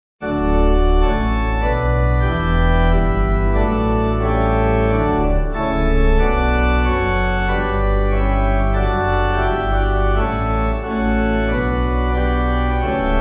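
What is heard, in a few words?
Pipe, Pipe Organ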